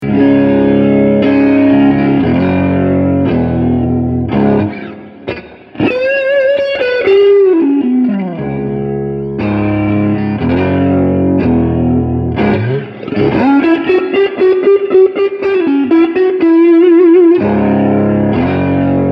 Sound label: Vibratone; fender